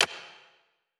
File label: projector start